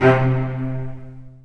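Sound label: Cello Spiccato C1; Digital Sound Factory Virtuoso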